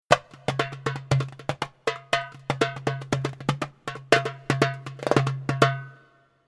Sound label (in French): son darbuka